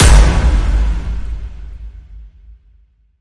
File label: Surge Energy Slam